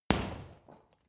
boxing punching bag hit hard; boxen

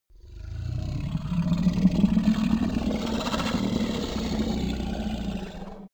minotaur grunt; kreaturen

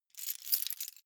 key ring large rattle; objekte; schluessel

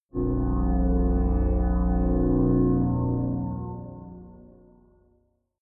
sci-fi saturn night, drones

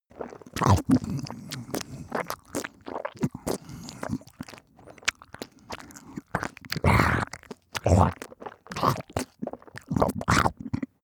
death burble long; koerpergeraeusche; menschen; soundpool

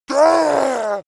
yeti grunt pain; kreaturen

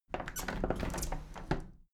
window handle plastic squeak open tilt soft; objekte; fenster

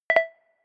notification bleep

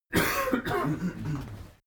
male coughing; soundpool

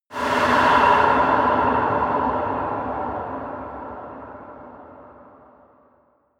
air short storm; whooshes rises

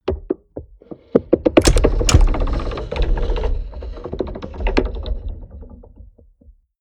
mechanics large rattle; industrie-wirtschaft; mechanik

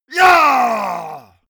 monster growl attack throaty; schreie

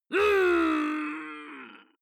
male fight pain groan long; vocals; comedy-cartoon; soundpool

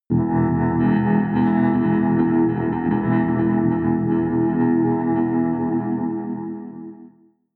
synth drone rattle rhythmic, drones

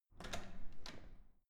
security door open

